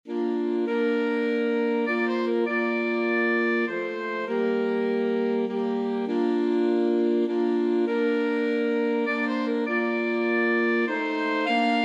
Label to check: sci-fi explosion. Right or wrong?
Wrong